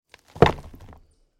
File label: Dirt Shovel on Coffin